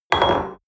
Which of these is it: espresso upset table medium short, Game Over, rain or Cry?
espresso upset table medium short